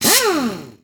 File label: Pneumatic Impact Wrench Single; monster truck destruction; android